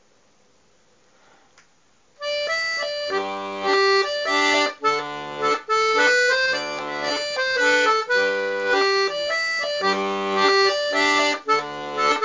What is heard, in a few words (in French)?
Mazurka 2 mains lent